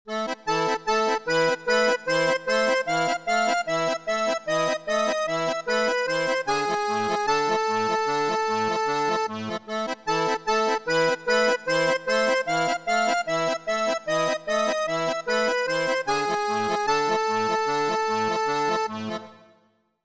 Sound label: Accordion